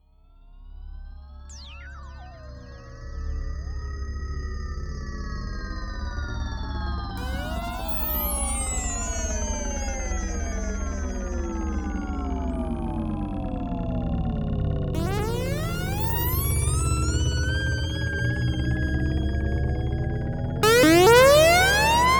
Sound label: Vermona Mono Lancet Envelope VCO